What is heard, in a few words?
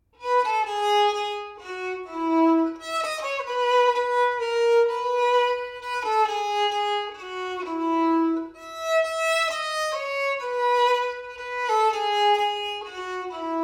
the new german waltz